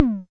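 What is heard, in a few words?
mattel tom, Mattel Synsonics drum kit, lo fi; 8 BIT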